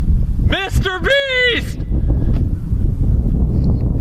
SHOUT; Mr Beast

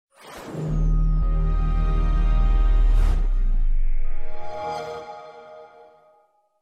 Xbox 360 Start Up

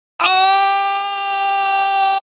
mafioso scream; meme

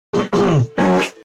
cough fart; Meme Sound Effect

